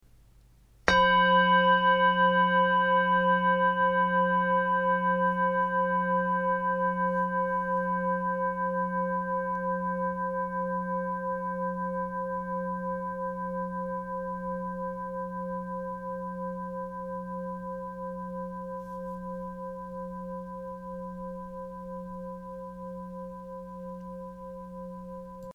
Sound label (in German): Klangschale